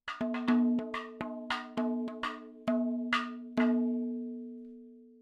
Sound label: 70 3 4 Mad Fills L, madhalam, percussion; Sample Banks; MADALAM 70 3 4 FILES